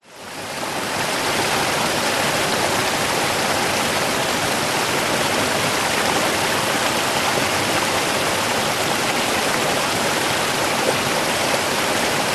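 nature creek forest running through tree debris close up; zapsplat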